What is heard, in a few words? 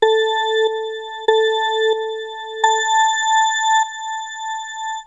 race countdown; tf2ware ultimate; mariokart